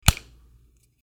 Meaty Slap

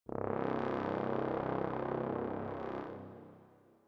Dramatic Brass

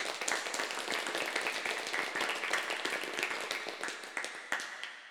Many People In Hall, Clapping

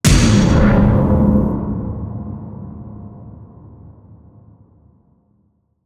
Tension Builder Impact

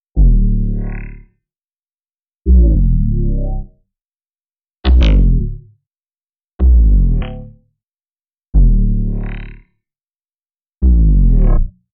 Impact High; Alient

